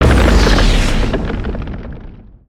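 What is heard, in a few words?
Earth Tornado Mid Blast; Spinjitsu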